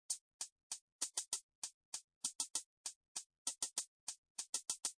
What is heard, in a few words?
Hihat Synth2 Loop 98Bpm, Hihat Synth Loop At 98Bpm; Synth Loops